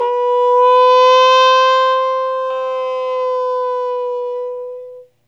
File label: TRUMPE, Trumpet FX; Roland - LA Composer vol. 2